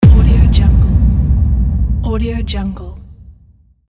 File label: boom